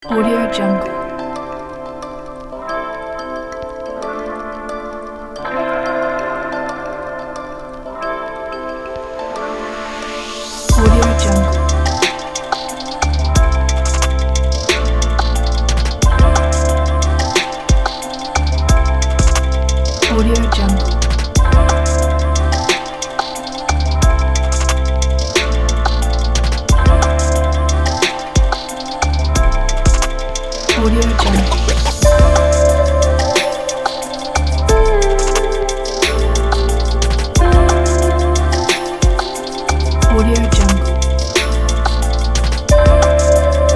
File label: elegant fashion chillout